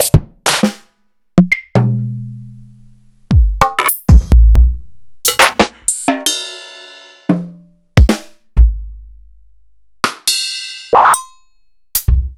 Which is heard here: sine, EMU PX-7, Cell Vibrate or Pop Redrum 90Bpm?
EMU PX-7